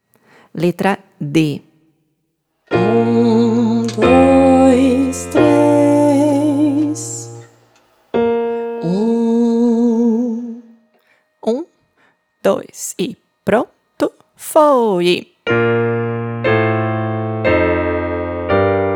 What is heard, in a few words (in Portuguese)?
Melodia Solfejo Melodico